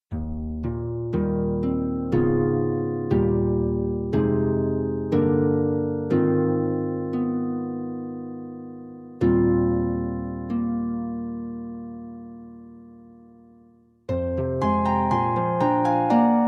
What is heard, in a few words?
Harp Music from Argentina; Manojito